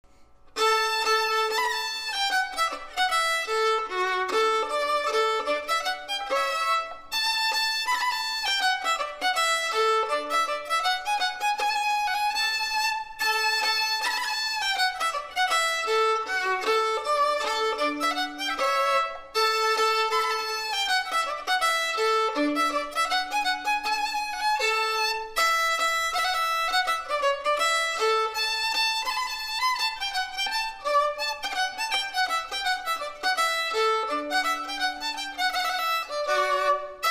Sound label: Trumpet Tune, violinist